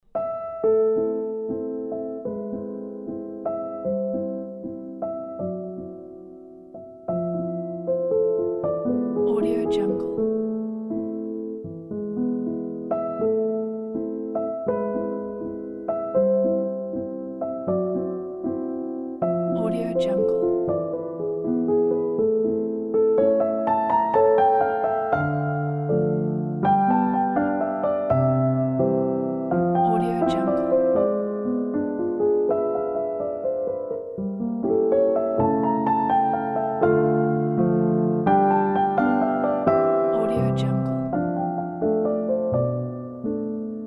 creative piano improvisation; q branch

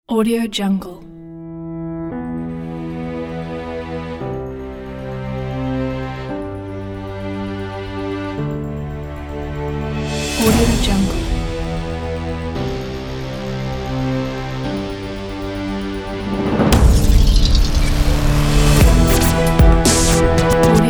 epic electronic

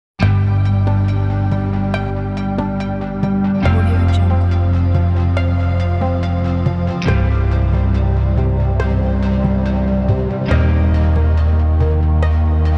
inspirational tech

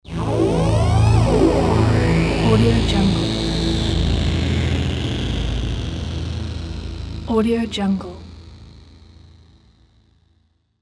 synth opening transition

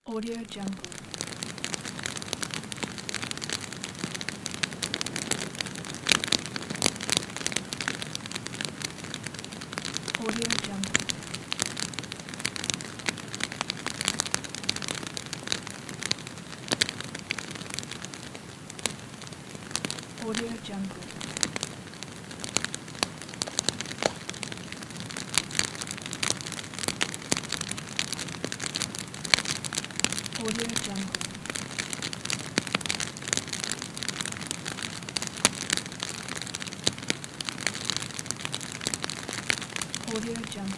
bonfire; fx library

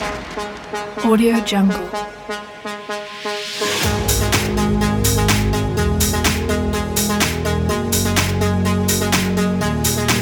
slap house

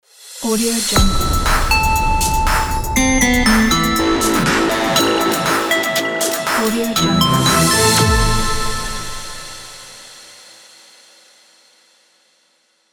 wave logo; bardim

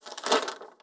paper turn page

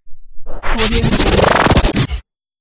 glitch

